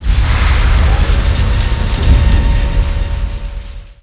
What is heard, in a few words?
building out; mcv; Emperor - Battle for Dune